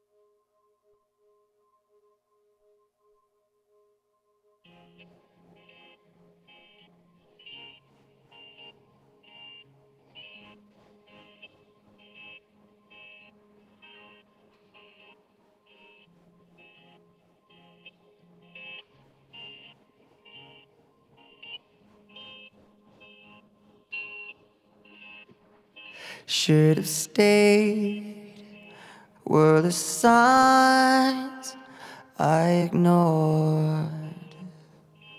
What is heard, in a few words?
acapella